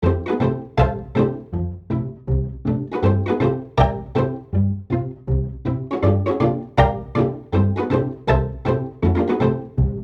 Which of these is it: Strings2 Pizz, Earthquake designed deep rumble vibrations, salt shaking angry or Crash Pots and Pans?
Strings2 Pizz